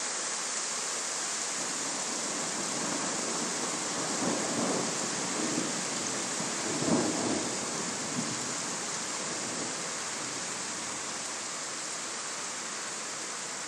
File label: heavy rain and thunderstorm